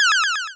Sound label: Cry